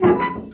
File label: Street Ball Hoop Squeak, Street Basketball; SFX Kit